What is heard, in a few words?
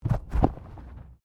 Body movement Fall Heavy